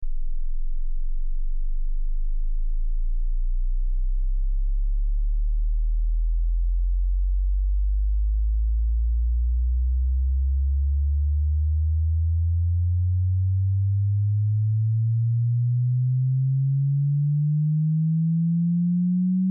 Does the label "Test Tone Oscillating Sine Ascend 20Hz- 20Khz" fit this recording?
Yes